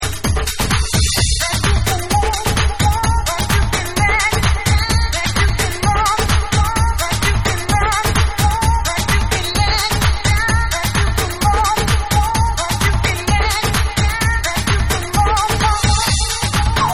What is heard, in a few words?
Dark Dub